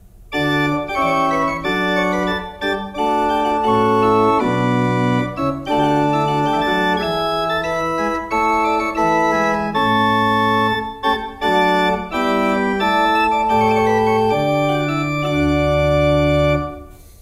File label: Grenzing, pipe organ